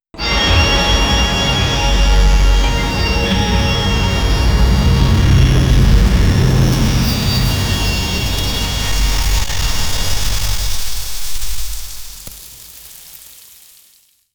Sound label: scary lightning sounds to, orchestral